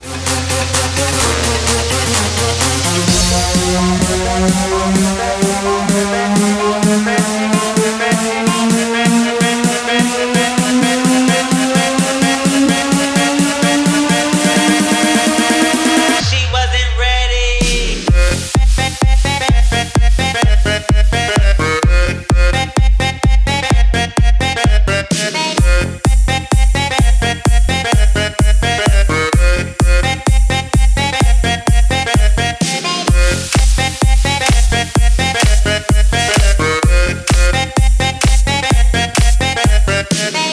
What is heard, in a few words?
edm